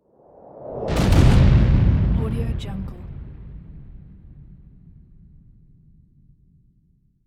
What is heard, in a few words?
missile explode